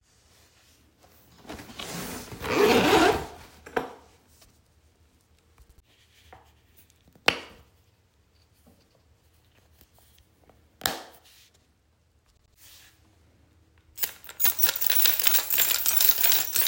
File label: Task 7 sounds